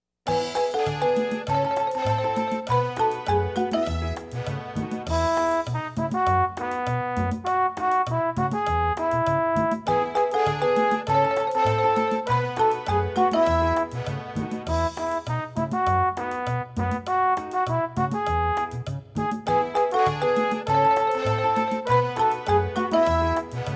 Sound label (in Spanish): (No Vocals)